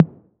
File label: 808 SOFT; Wizoo - Platinum 24 Electronic